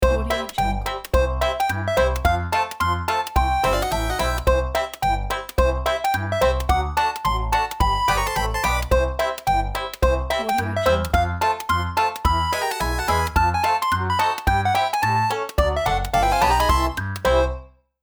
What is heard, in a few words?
chip time logo; drtimwaters